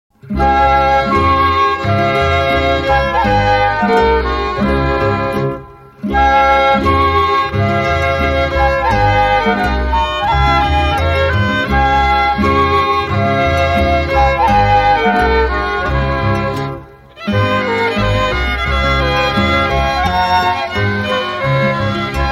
quadrille medley instrumental; swiss quadrille medley part 1

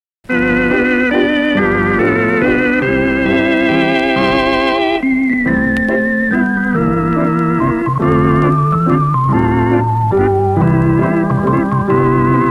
waltz singing quadrille instrumental; 5 part singing quadrille part 4

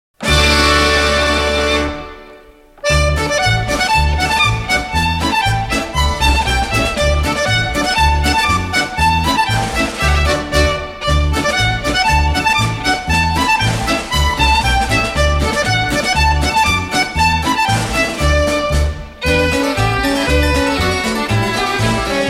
windmill quadrille instrumental; krusel-konter

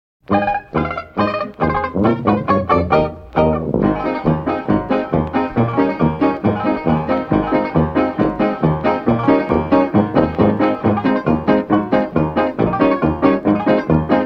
square dance reel (instrumental)

